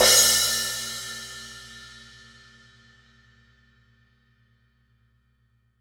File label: Splash Cymbal; Sonic Foundry (Sony Creative Software); Joe Vitale Latin Percussion